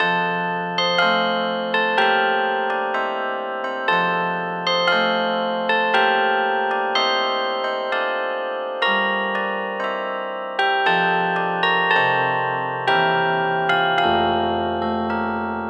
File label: Flemish, carillon